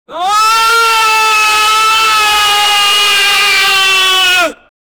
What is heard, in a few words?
man screaming with pain; anguish